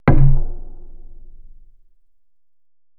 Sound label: PERC MIX